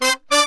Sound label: 100 AM L, BRASS SALSA